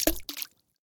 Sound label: water throw stone